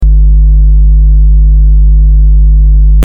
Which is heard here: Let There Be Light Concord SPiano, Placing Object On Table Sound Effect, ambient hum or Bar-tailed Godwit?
ambient hum